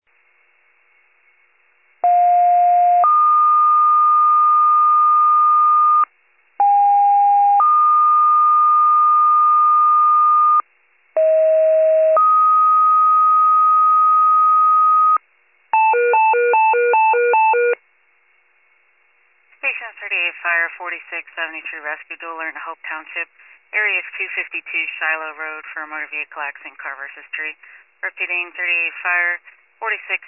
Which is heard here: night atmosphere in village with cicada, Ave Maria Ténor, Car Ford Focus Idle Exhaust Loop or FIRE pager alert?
FIRE pager alert